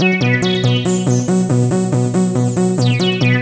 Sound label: fade in, 140bpm, keyboard; hous-tec